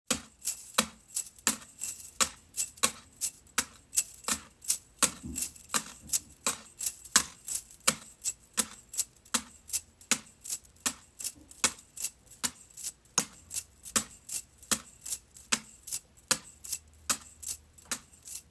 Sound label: Slinky